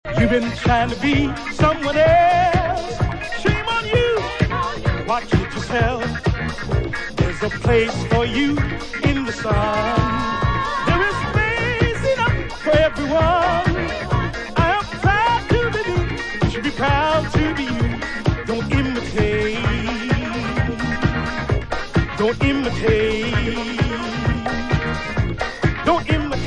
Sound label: vocal; disco